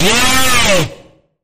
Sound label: Sharp Bend, C; Sharp Bend Ahead